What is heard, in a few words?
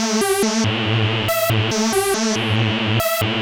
TECHNO SYNTH 140; Keys